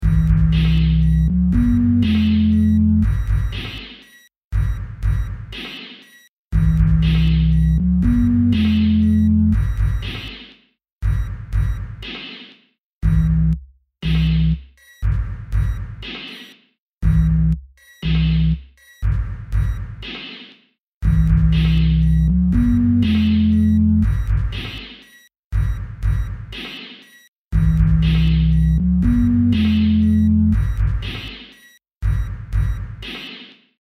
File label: single cycle 1k